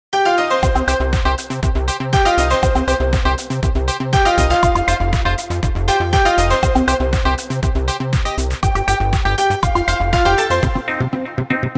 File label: European Electronic; EDM 리듬